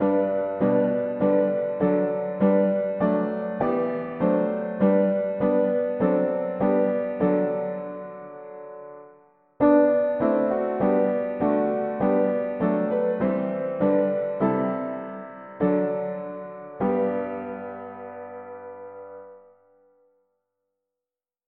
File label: mono piano; Precious precious blood of Jesus; hymns